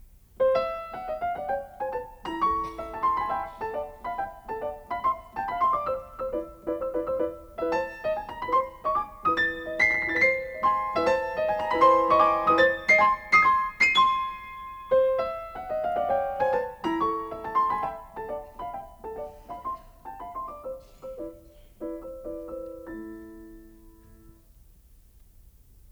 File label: for piano, Con eleganza; Visions fugitives (20)